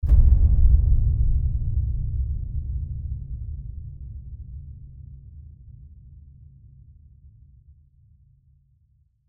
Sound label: Epic Low Hit